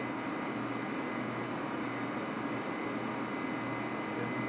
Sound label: auto turret ambient loop; Rust